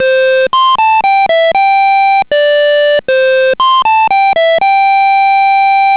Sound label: pc single